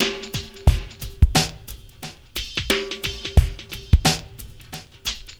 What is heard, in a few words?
REMIX, SWINGTOMS; Spectrasonics; Liquid Grooves 1 & 2